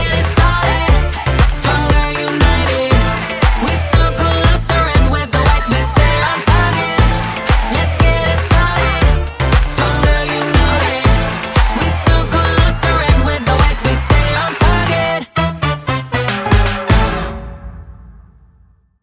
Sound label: POP